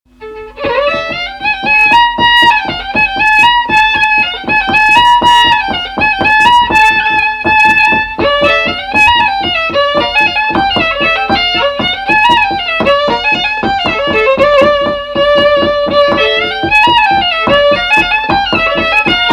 Bourrée; D'ou venetz vos piarre

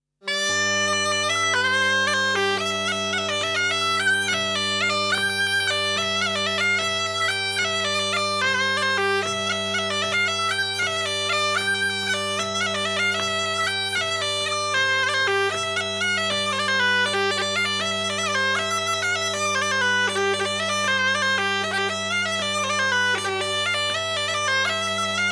Bourree; Bourree de Jabut

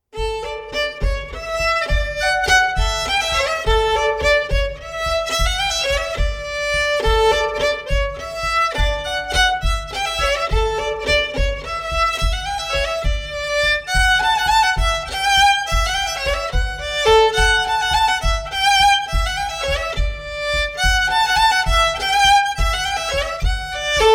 Montagnarde; Recueil Hainl; Montagnarde d Aurillac 2e air

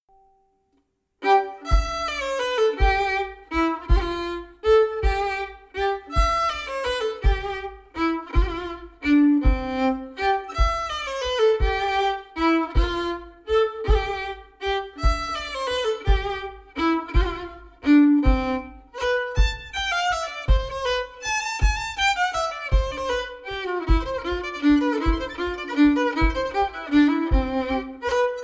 Valse; Les premieres valses 1ere livraison manuscrits regionaux

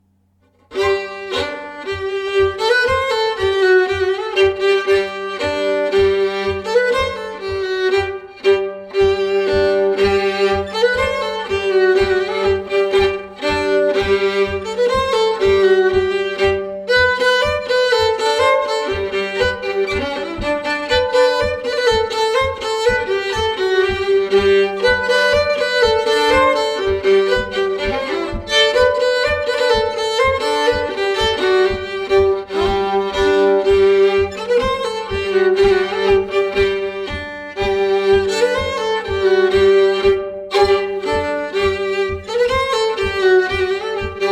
Bourree; Recueil Hainl; Bourree de Saint Gervais

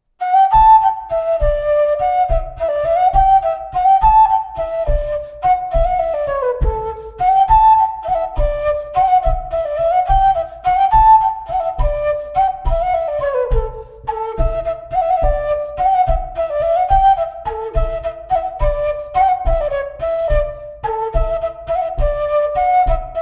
Montagnarde; Recueil De Raoulx

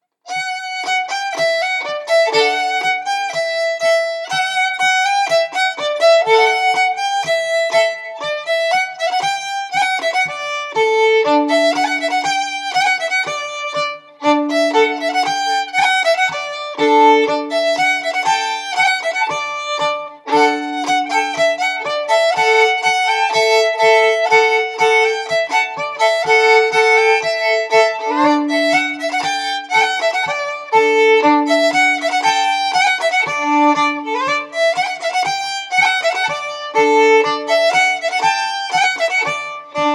Bourree

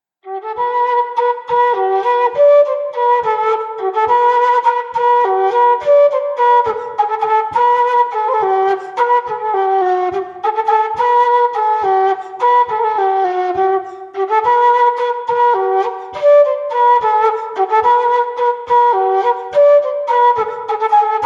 Montagnarde; Le coeur de ma mie; Salaberry 1802

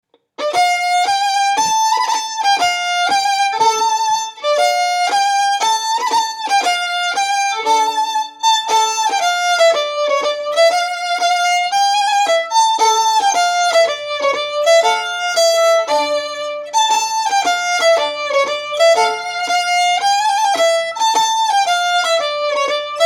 Grande Bourée Airs de ballets et d'opéras

